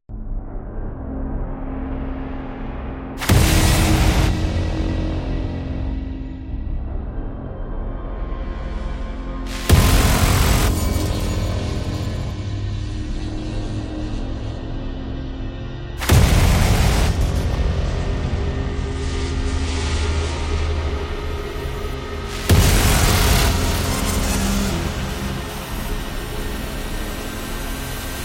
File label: Sinister